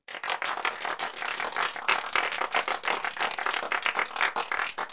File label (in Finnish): aplodit